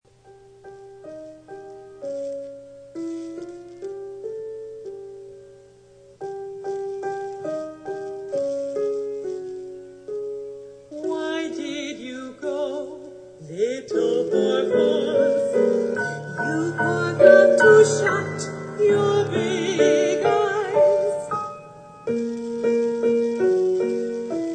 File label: Five Songs For Contralto